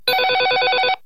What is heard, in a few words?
Transceiver